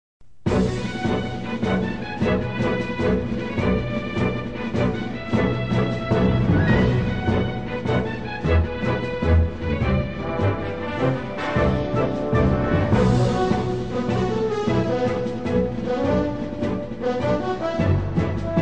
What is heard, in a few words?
menu, Bomberman sound